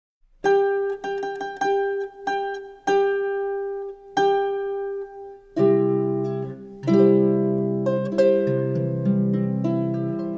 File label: bridal chorus, harp